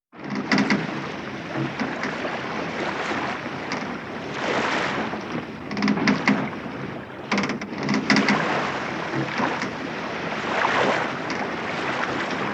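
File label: yacht, sailboat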